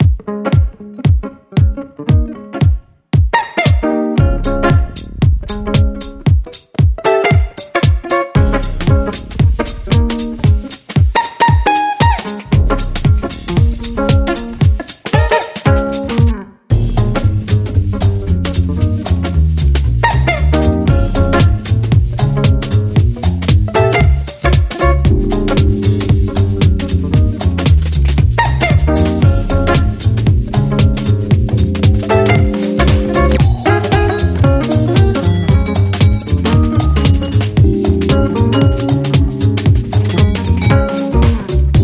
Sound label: Jazz, Inst